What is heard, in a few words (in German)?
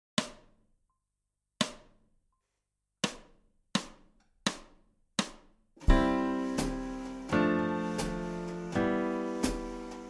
changing in moll aeolisch